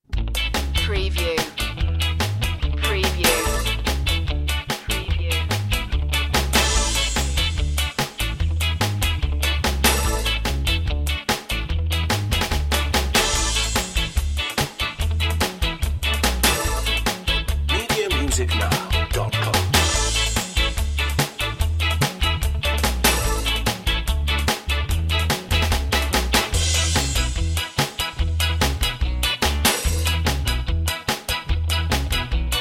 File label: ska park underscore